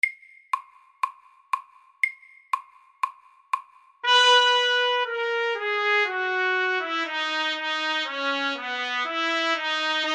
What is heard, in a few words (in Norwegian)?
Etyde i Dess dur FORT